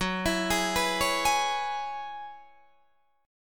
Gb 7th Suspended 2nd Suspended 4th, Guitar, DADGAD